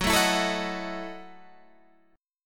Gb Minor 13th Gb down Guitar Open G

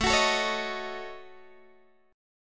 A 6th Flat 5th, Guitar, DADGAD

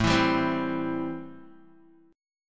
A-Minor 7th A Guitar; 4,7,7,5,4,x; Am7 strummed; JGuitar

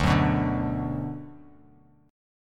D 6th D down, chord

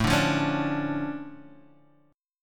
D Minor Major 7th, A, guitar

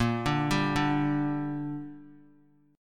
A sharp 6th; arpeggiated; JGuitar

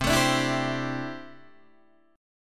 C 6th Add 9th C down Guitar Open D

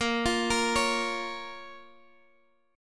A sharp Minor, Guitar; 18,20,20,18,x,x; 4E,4A,5D,5G,5C,6F; arpeggiated

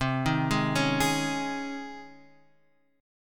C sharp Major 7th Suspended 2nd C

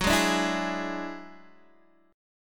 Gb 7th Suspended 2nd Sharp 5th, Guitar, Open D

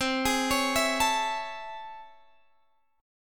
A Major 7th C; arpeggiated; JGuitar

